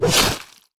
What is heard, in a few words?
vampiric strike cast; heroes; anya